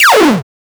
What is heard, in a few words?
laser shooting for a 16, 16 bit